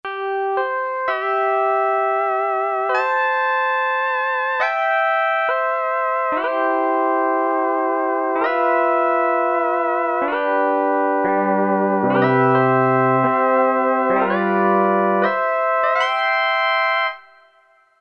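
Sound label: guitar Lap Steel; E-mu Proteus FX; Factory Presets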